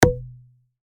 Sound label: pop start; Handy